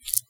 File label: Egg Crack